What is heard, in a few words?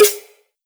punchy snare